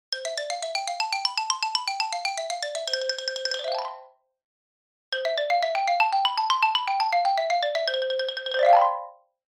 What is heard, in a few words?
xylophone; vsl